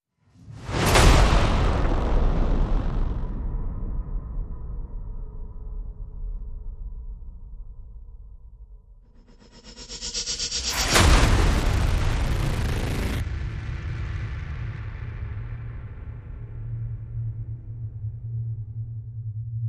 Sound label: Smash And Drone